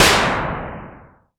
Blast, Drum Machines Roland R-5

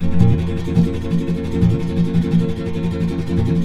B M, Guitars; Spectrasonics; Hans Zimmer Guitars VOL-2; FLAM TREM CH

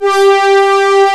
MEM MOOG G4; E-MU Formula 4000 Series Vol. 5; Protozoa

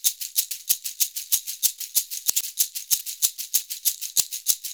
SHAKER, Modern Percussion Loops; USB Soundscan vol.56; AKAI

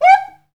PRC XCUICA, Skin Drums

